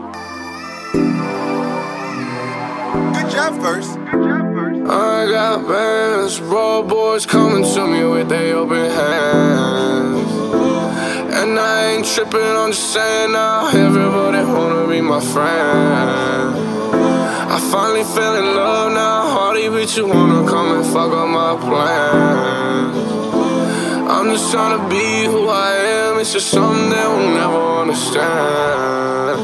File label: Hip Hop Ringtone